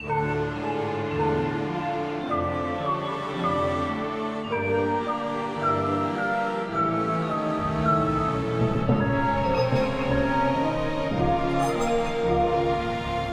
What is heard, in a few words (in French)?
Horreur orchestral; brunette music